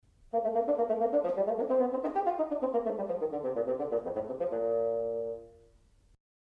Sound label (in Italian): fagotto staccato; strumenti